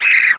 Ant hit squeel; blastmorph pinball; xbox series xs

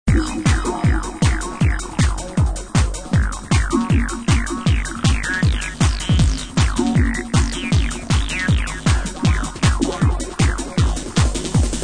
Loop; Chaos Faction

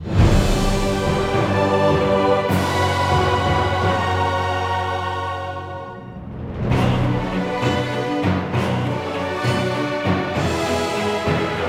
Debriefing Win

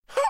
move down; Becca

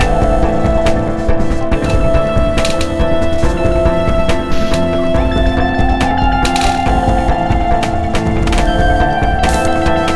Trainride Stationary Medium

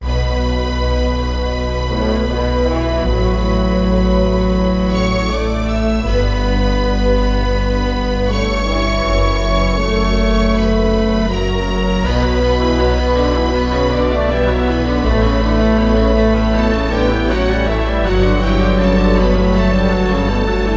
Failed; eikan wa kimi ni koushien he no michi

